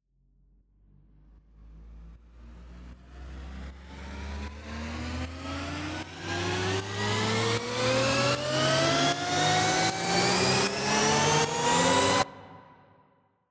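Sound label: Future Bass FX Riser Main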